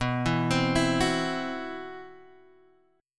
C Major 7th Flat 5th B

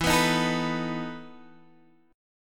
E Major 7th Suspended 4th E down; EM7sus4 strummed

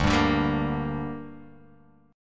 D Suspended 4th Sharp 5th D down, chord

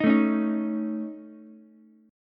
Db Suspended 2nd Sharp 5th, Ukulele, chord; strummed; Dbsus2#5; JGuitar

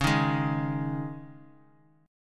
Db Suspended 2nd; strummed; Dbsus2; JGuitar

